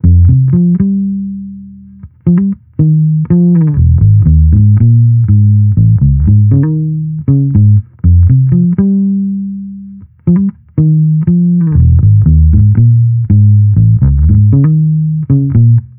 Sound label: Amped loop 120 Fm, Electric Bass Loops; Slate Digital BASSHOLE Sample Pack